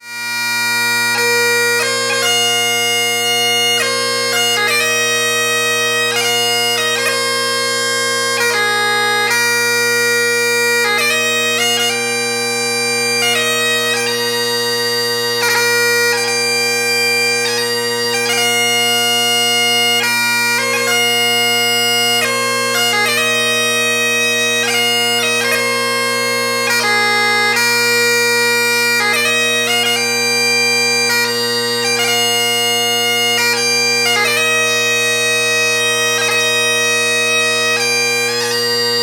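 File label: Traditional Scottish, bagpiper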